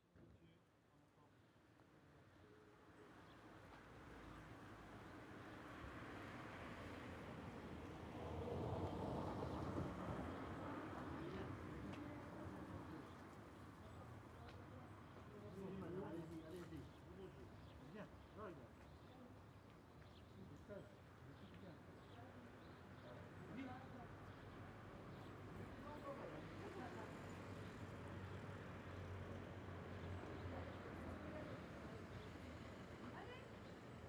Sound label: Bells mix paysage; lesconil2025